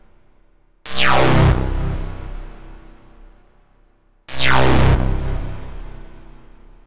Note Single, dubstep

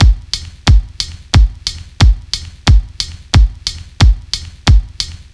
Stand B, electric jazz

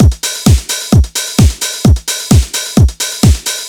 Tech Beat C, евродэнс

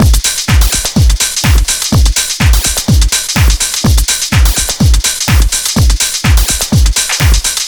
Zippel Beat B, dance

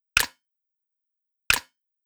Side Stick

